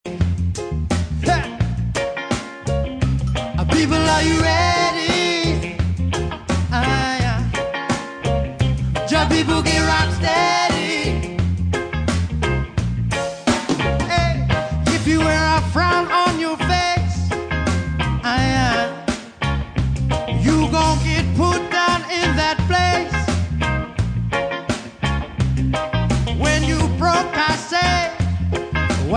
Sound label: Music Compilation; Boil-Up